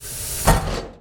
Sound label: boost recharged